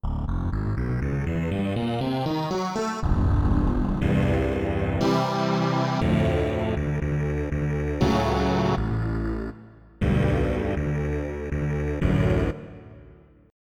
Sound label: Buzzsynth